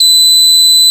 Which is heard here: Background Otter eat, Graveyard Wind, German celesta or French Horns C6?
French Horns C6